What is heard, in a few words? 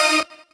normal hit normal